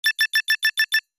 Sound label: Computer beeps digital menu beeping; sci-fi